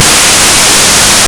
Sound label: wookie, voices male; Женские голоса; Вуки